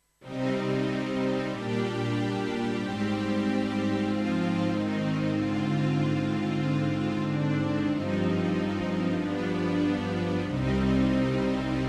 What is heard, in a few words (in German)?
solo streicher chor